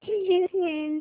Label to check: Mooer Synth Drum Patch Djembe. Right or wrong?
Wrong